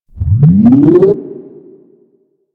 Elevator Start; Clan Dojo

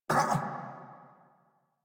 Pain; Warframe; Eidolon Grineer

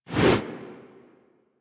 Generic Weapon Aim Transition In; Warframe; Weapons

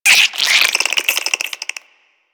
Infested Minion Attack, Vocals; Warframe

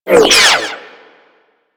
Energy Projectile By; Corpus

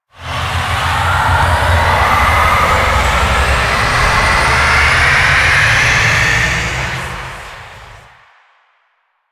Liset Extraction Depart, Vehicles; Stalker Liset Skin; Flight

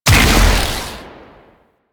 Modular Secondary Barrel Fire; Warframe; SU Modular Guns

